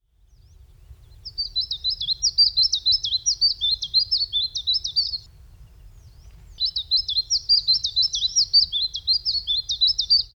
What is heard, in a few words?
song siberia, tristis